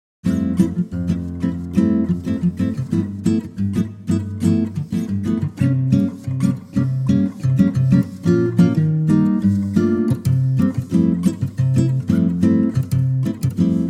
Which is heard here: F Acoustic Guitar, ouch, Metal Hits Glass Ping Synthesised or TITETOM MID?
F Acoustic Guitar